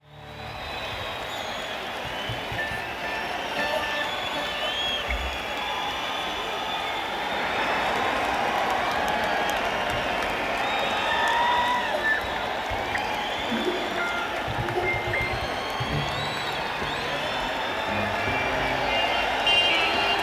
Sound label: Crowd Tuning